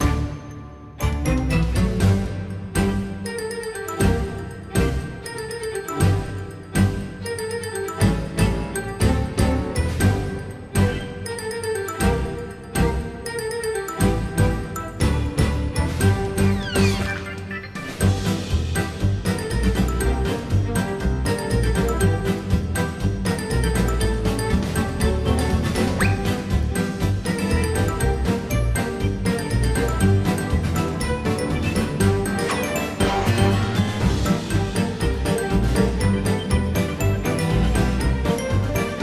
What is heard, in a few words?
Comedy Ambient